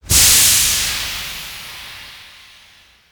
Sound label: Steam